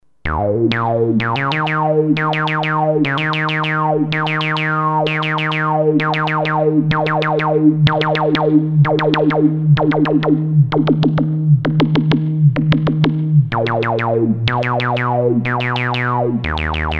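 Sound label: decay, synthesizer; synthtech; motm 800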